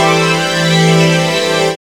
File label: SYN CHD